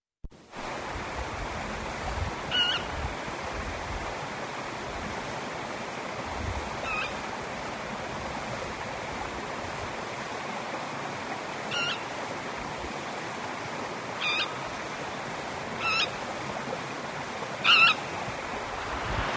Loro Coroniazul

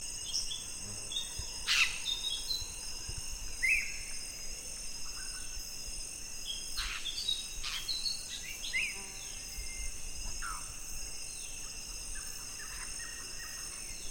Loro Timneh

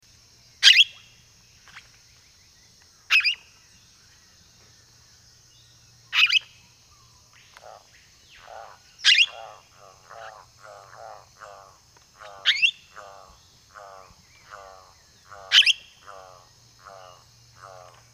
Cotorra Cabecinegra

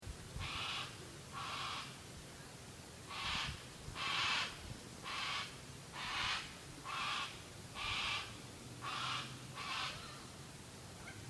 Cacatua Funebre Coliamarilla

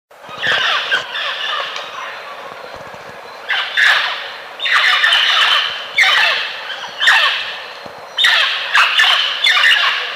Amazona harinosa surena

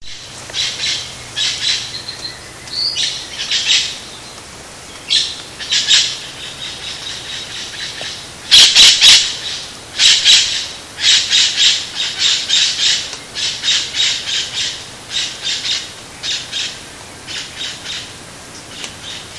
Loro Choclero canto

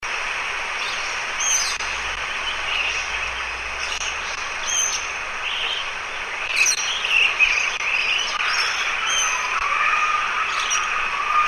Lori de las Tanimbar

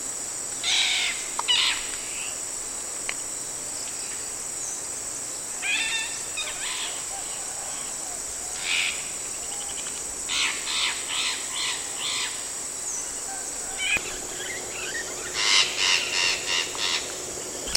Lorito momoto amarillento

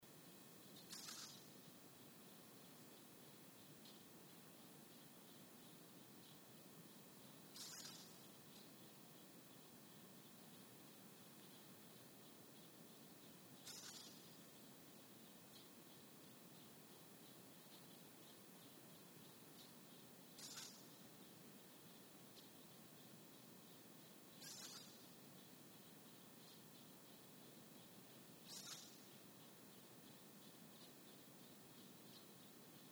Lori Escamoso

